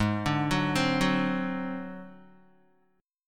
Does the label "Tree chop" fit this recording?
No